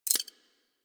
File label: Pistol Alt Target Marked, weapons; Warframe; Thanotech